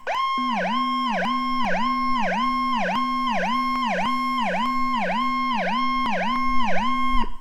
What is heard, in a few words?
WARBLE & Howler